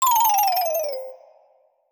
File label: Lose Bonus Tokens; Donkey Kong 64